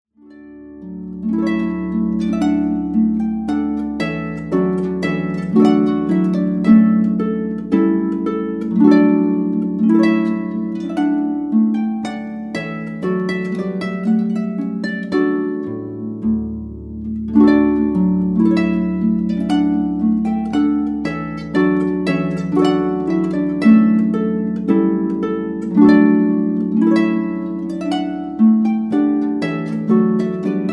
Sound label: Trumpet Voluntary